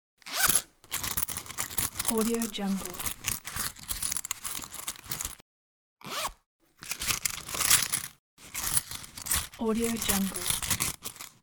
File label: searching coins in the wallet